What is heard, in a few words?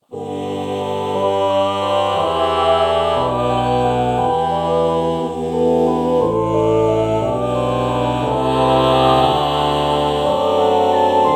ah oh morph